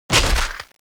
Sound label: bone being crushed; Hanuman Return